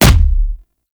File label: Explosion Punch Generic Large; Explosion Punch Layers; Weapons